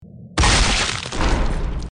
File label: death; dandy's world; roblox